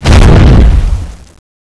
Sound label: cannon start; giant titan